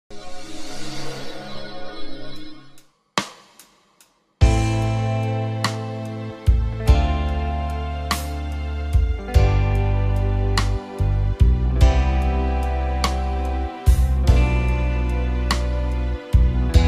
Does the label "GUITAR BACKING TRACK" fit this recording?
Yes